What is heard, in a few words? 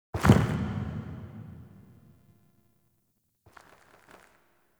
Slo Mo Steps; Cinematics; Finale; Ballas